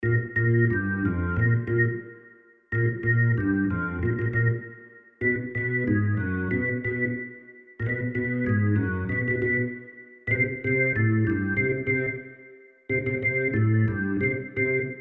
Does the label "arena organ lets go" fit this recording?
Yes